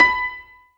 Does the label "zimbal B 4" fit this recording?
Yes